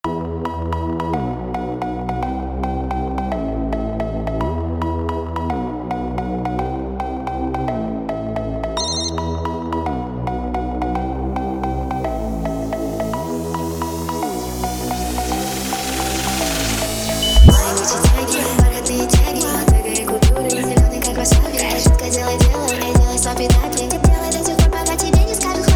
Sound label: Phonk Remix